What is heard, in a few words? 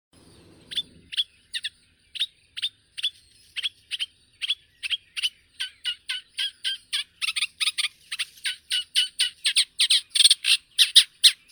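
reed warbler, acrocephalus scirpaceus; kamishovka trostnikovaya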